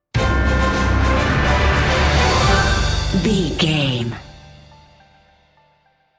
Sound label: cinematic adventure sting bumper